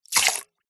human spit water out into metal bowl short small amount